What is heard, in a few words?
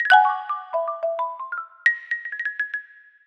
Marimba; musicradar; cartoon caper